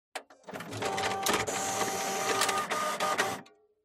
Printer

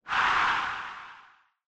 Baseball Cheer; SNES

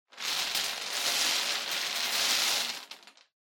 Bingo Machine Spin

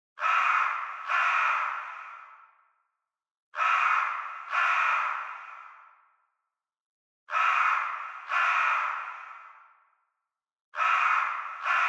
Crow Real Ambi

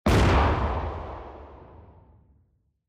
Cannon

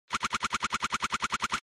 SNES Action Message

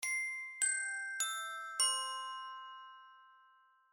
Chime Announce

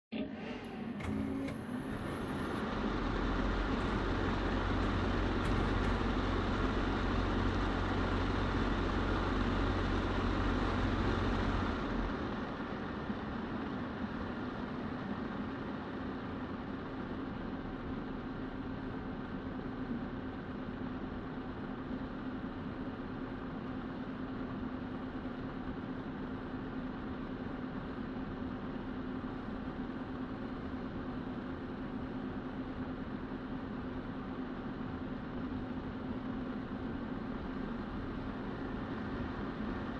PC Operating Noise On Off

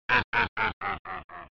Fighting, Laugh; SNES

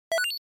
NES Action Item

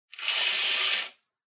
Curtain

